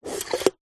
household cafetiere plunger push down fast coffee inside; zapsplat